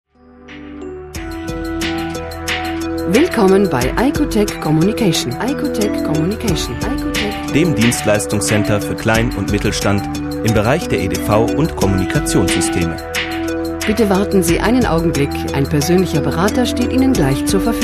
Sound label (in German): warteschleife; icotec